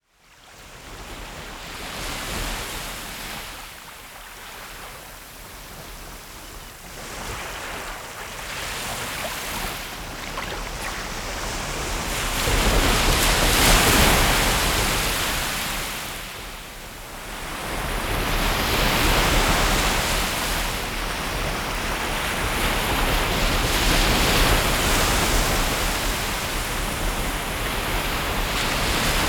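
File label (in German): Meeresrauschen Naturgeräusche Meeresrauschen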